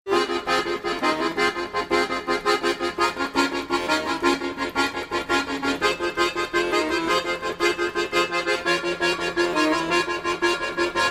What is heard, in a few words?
INVERNO ACCOROCK